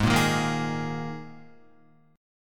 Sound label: Ab Suspended 2nd Guitar; strummed; JGuitar